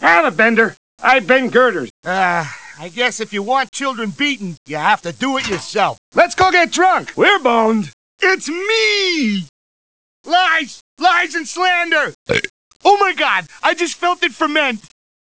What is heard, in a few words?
chip 8bit